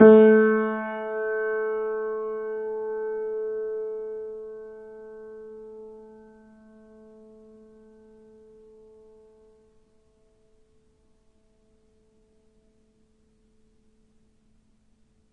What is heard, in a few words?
piano